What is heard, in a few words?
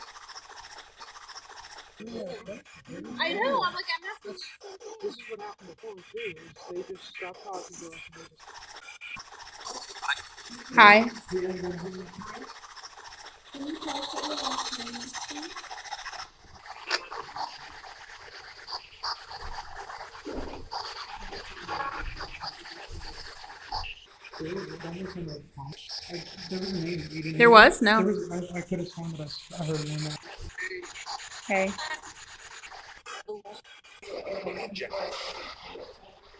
Walnut Room Spirit Box; blennerhassett island; Hi John John Hey Hi Almost John; case study 4 investigations